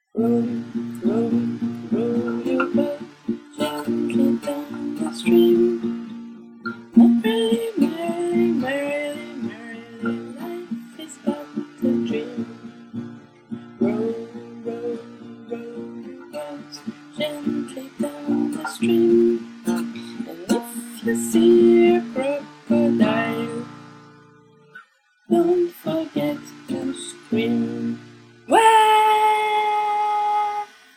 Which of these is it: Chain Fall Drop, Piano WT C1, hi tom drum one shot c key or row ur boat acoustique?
row ur boat acoustique